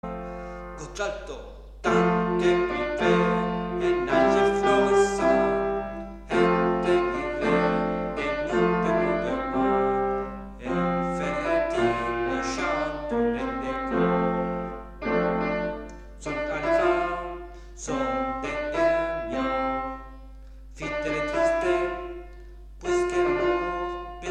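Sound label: alto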